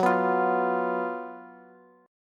Gb Major 7th Suspended 4th, down, Bass; strummed; JGuitar

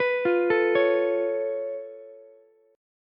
C sharp Augmented 7th, C sharp, down, Ukulele; arpeggiated; C#+7; JGuitar

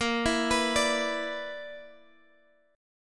B-Minor Major 7th Double Flat 5th

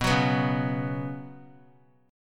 B Suspended 2nd, chord; strummed; JGuitar

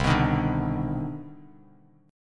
C Minor Major 9th, Bass Standard, CmM9 chord; strummed; JGuitar

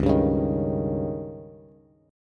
Db Suspended 2nd Flat 5th, Bass; strummed; JGuitar

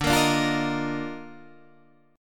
D sharp Major 7th Suspended 2nd D

